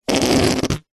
leisure whoopee cushion squeeze fart short hard powerful